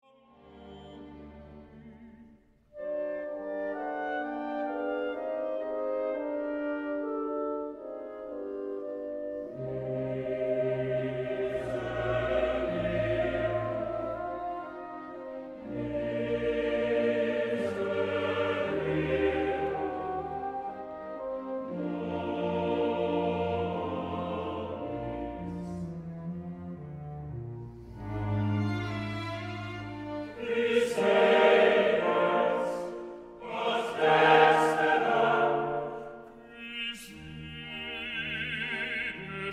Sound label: missa in d minor; eta hoffmann